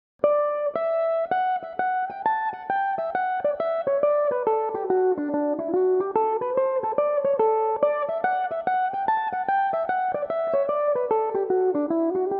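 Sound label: D major, Irish mandolin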